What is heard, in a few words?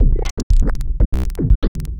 Subtractive Beat